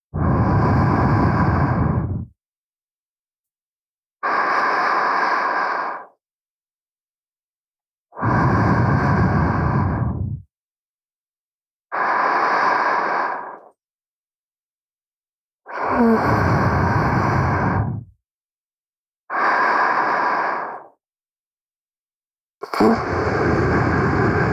添い寝; SEあり

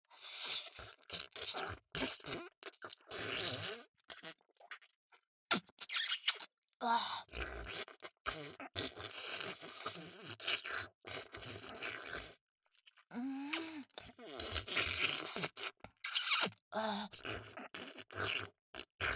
お掃除フェラ, ASMR; アドリブ集

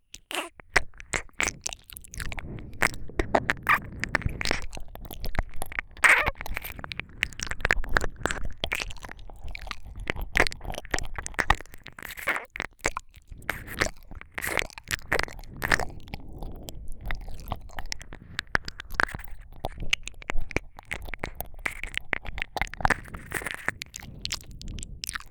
ゆっくりじっくり耳舐め(両耳同時ver)

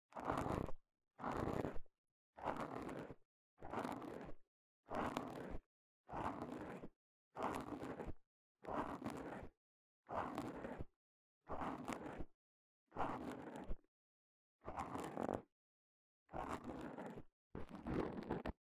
耳かき音のみ; キョクドー耳かきIどるず 花岡結～耳剃り耳かきマッサージでゆっくりまったりリラックスタイム～

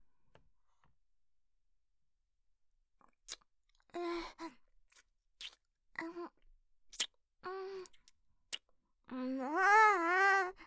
キス; マゾハーレム

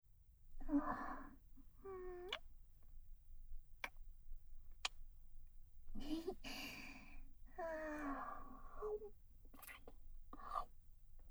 耳奥本気グポグポ耳舐め＆エロ吐息ループトラック, ASMR; エロ舌JKアスカちゃん; 学園耳舐め裏リフレ

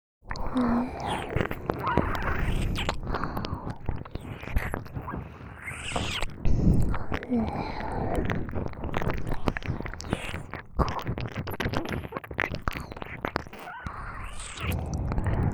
耳舐め, ASMR; ソフィ; ゲーマーシスターさんと小悪魔シスターちゃんのダブルドスケベ懺悔射精マゾ性癖矯正プレイ

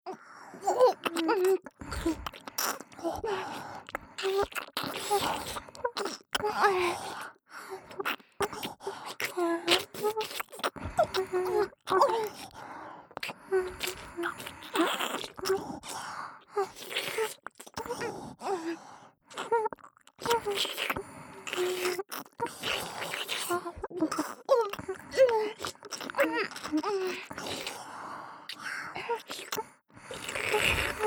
左耳キス舐め; ラブマチオ; オナサポおまけトラック

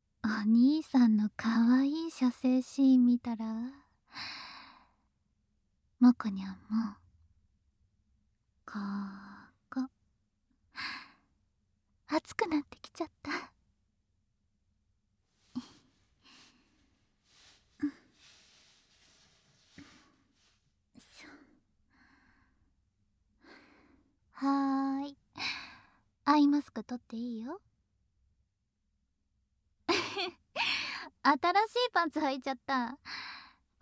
耳舐め手マン, ASMR

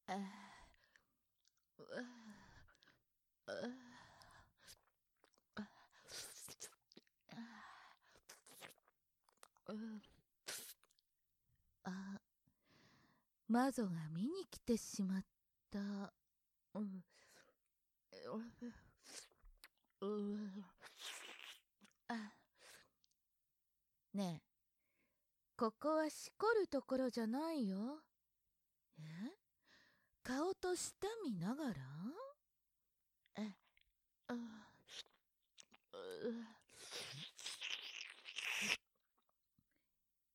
耳舐め配信; ほぼ1分で抜けるマゾ向け音声集

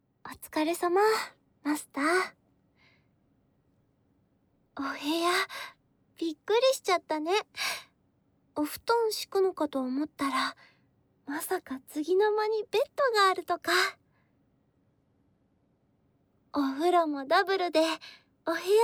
真竹の煤竹耳掻きで、王道かつ丁寧な右耳耳掻き; 真竹の煤竹耳掻き・添い寝; 蓄音レヱル すずしろ; CV.上坂すみれ

